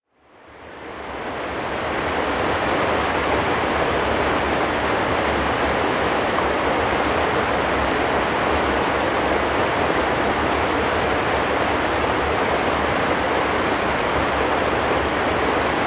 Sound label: Nature Sounds